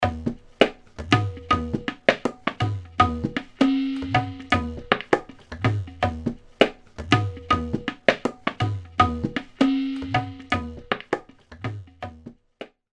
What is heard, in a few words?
Indian Tabla; Apple GarageBand 2; Apple Loops